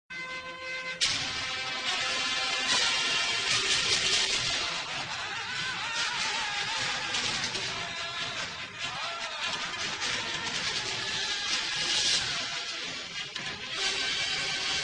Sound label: Bgm; Usthad Movie